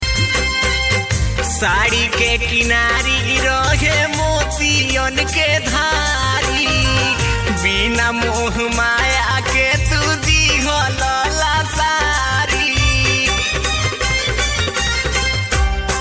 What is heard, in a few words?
BHOJPURI RINGTONE